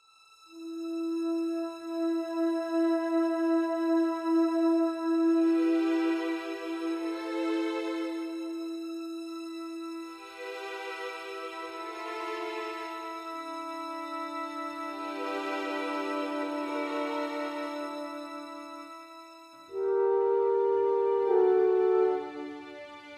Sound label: orchestral